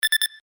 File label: lockon